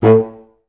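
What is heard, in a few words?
staccato A1, Orchestra; Best Service; Peter Siedlaczek's Advanced Orchestra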